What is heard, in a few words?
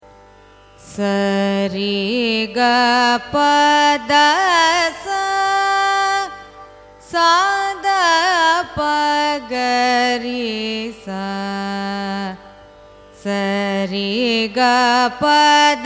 raga mohanam arohanam avarohanam; carnatic music